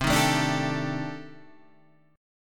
C Minor 6th Add 9th, C, down, Guitar, Open G

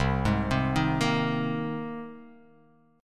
C Minor 7th Sharp 5th C

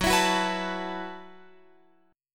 F sharp Minor 11th, Guitar, Open F; F#m11 strummed

